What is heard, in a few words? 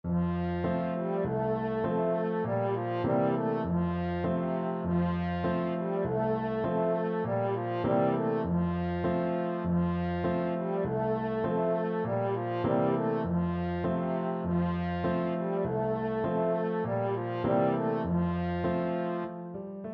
alouette, french horn